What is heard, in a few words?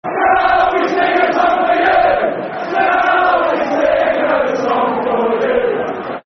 Shall We Sing A Song For You, Football Chants; Man City Mcfc